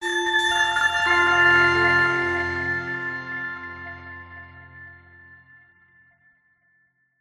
multimedia logo musical short warm delayed soft medical care; zapsplat